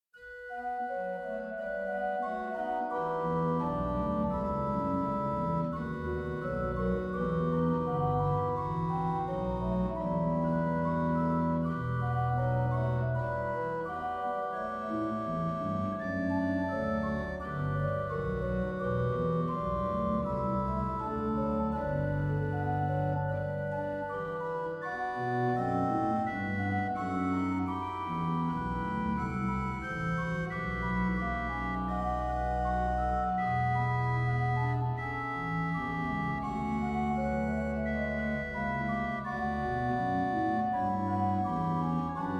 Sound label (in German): Orgelbuechlein Vater Unser im Himmelreich; BWV 636